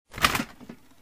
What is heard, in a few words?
fridge; WMBonusXP